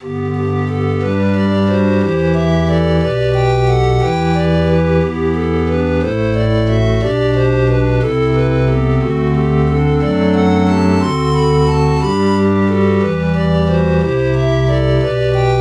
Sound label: pan flute bach samples